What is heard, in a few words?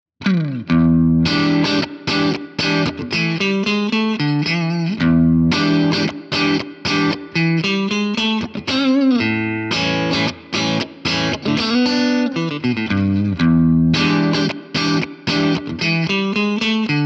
jc10-50el breakup; jensentone